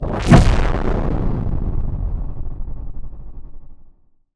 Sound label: Swell To Impact Distorted; bottlerocket